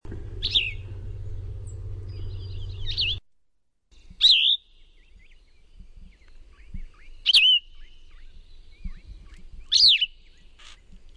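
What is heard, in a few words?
Plumbeous Vireo song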